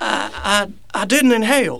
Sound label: INHALE